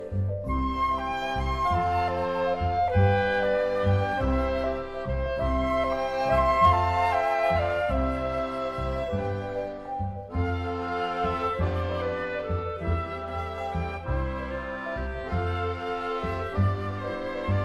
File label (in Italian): accordion con marimba